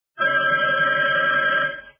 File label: ring